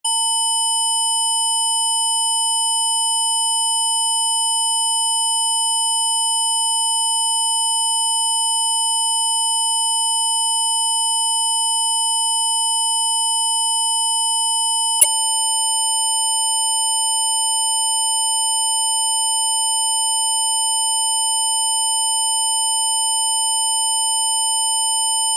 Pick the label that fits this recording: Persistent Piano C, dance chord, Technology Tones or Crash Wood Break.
Technology Tones